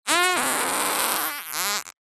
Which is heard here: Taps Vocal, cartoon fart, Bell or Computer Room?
cartoon fart